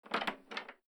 door open

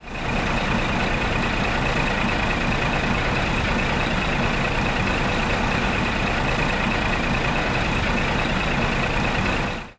truck idling; transportation

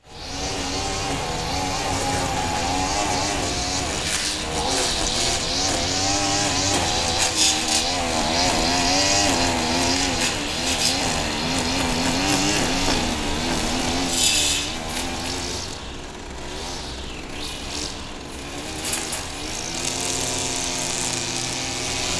grass trimmer; mechanical

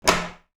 door open

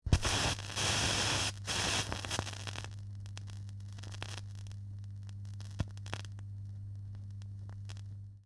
needle drop

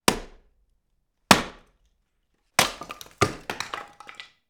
wood chop axe hit; nature

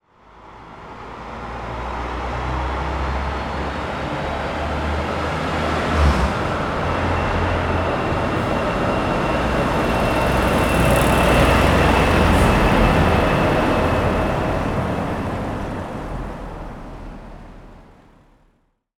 truck pass by slow; transportation

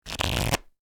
shuffling cards

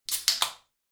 can open; drinks

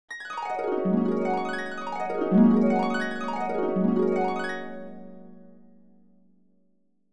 dream harp

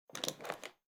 handbag lock

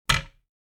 cabinet door close

